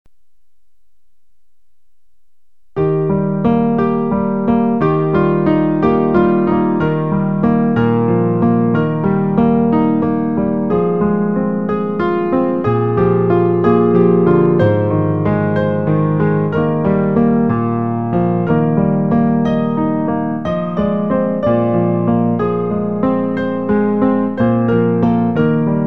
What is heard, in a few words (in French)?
piano; recueil Louez Dieu